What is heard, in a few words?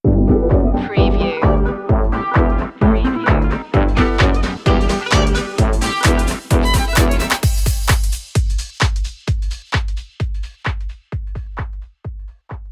doo wop bop stinger